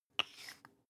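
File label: toothpaste close twist hard, Bathroom; haus-garten; badezimmer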